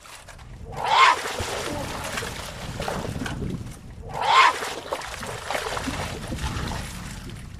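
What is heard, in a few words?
chinese water deer distress call; Vocalisation; When distressed a Chinese water deer will sometimes make a "whelp-like" call that sounds like a variation on the contact bark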